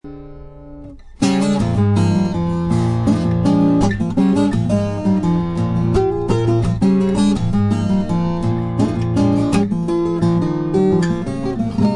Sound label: jazzblu